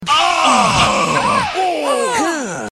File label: Gasp